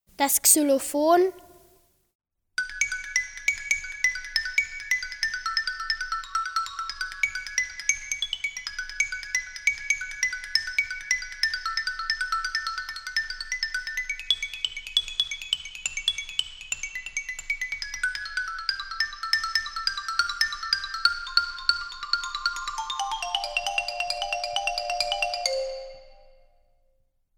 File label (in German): Xylophon; Karneval der Tiere